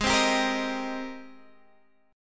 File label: Ab Major 9th, Guitar, Standard; strummed; x,11,10,12,11,11; AbM9; JGuitar